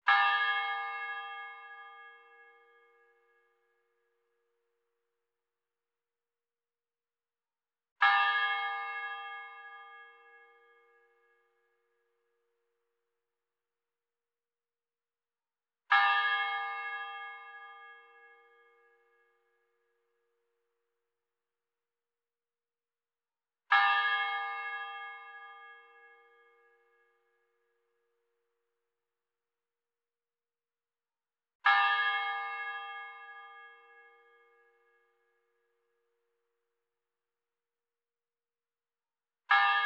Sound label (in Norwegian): ta keklokka hort i bendiksbukta